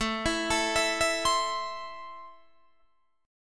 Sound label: A Minor, Guitar, Open D